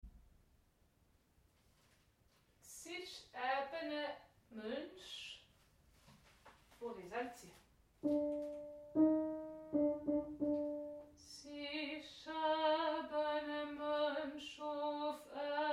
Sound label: alto